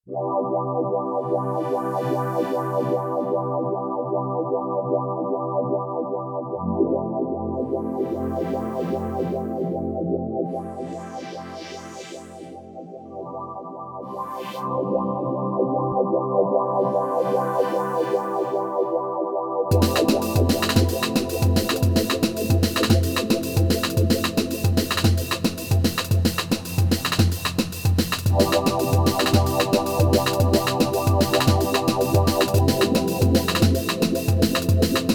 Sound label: Wah Pad, Korg Radias-R